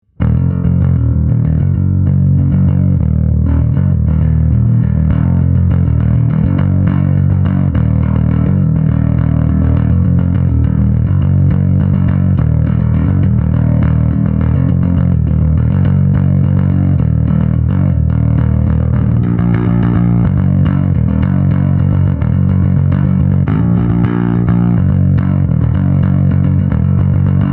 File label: bass tone